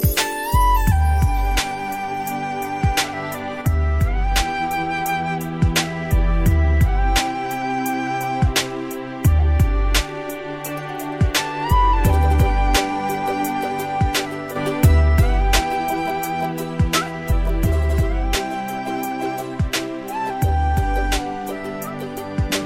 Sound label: rap bgm; electronic